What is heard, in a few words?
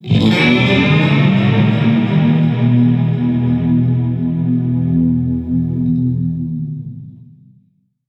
Blow up